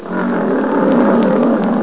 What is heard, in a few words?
Growling Lion